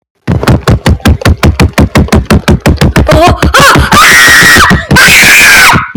toc toc ahhhh

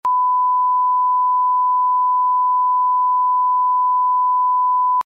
censor bleep

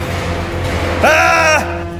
aaargh

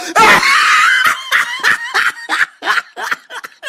laugh meme

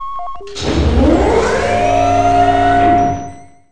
startup hyperspace

